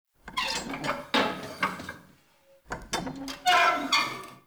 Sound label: Light Mirror Hang Squeak; Puzzles